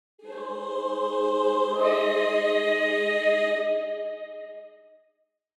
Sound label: Angelic choir angels video game you win